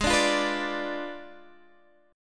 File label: Ab Diminished 7th Ab, Guitar, Half Step Down